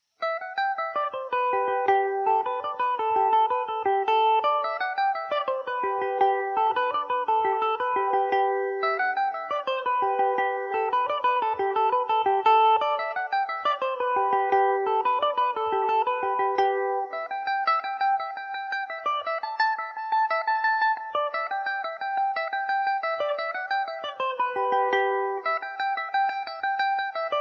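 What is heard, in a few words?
G major, Irish mandolin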